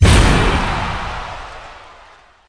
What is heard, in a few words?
bonus hit; football